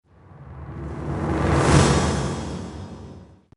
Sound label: Unknown Drum Cue